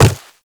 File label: Infested Footsteps Quadruped Medium Walk Dirt; Warframe